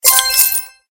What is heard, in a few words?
receipt Notice